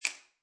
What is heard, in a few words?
Pill Bottle Cap Small Place Down, Foley, Pill Bottle Cap, Plastic, Small, Placed On Counter Top; Set Down